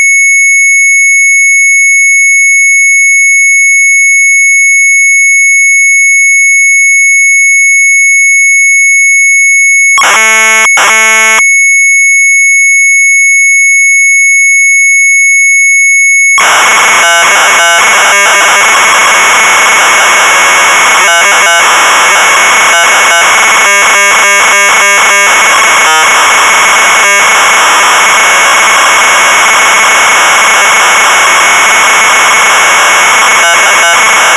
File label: Sharp MZ800 Tape; ZAHLENSPIEL; retromelsarchive